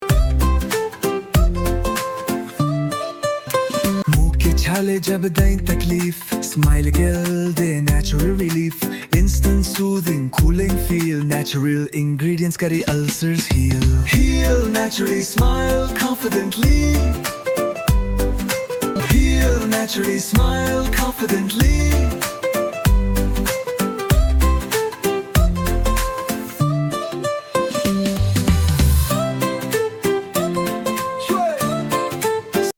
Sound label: jingle; smyle